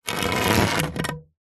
foley wood pole stick scrape drag short on concrete; zapsplat